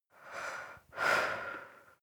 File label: breath female normal